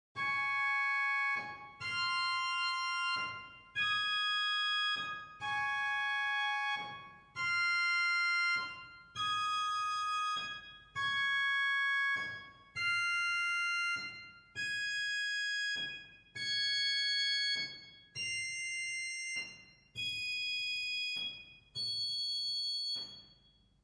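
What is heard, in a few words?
Zymbeln arpeggio, organ; Reinhardtsgrimma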